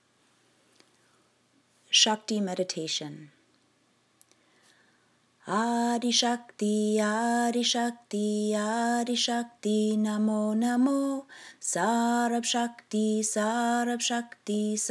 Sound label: Shakti Meditation